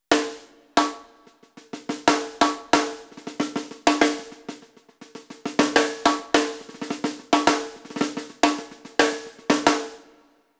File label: piccolo snare, electronic drums